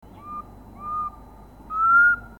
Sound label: cuculus clamosus, birds calls; cuculidae